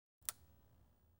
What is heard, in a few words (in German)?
knackender zweig; geraeusche digital